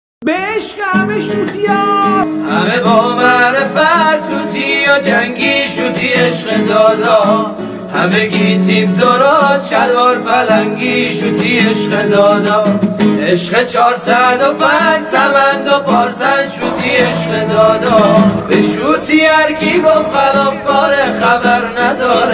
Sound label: guitari